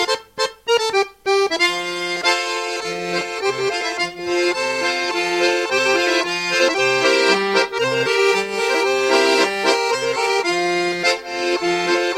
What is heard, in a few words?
Polka Piquee